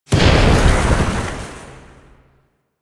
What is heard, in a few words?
Sonic Dissipation Proj Explosion; Warframe; Focus; Vazarin